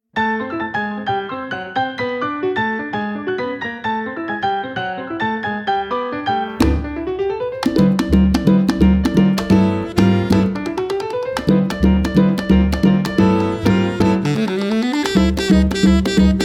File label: bigband